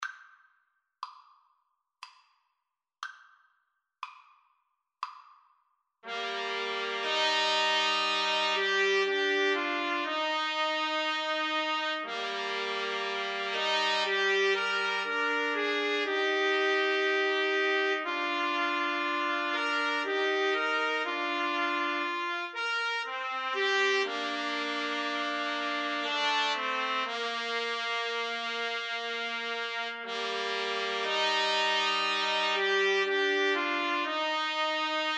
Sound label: clarinet concerto, trumpet trio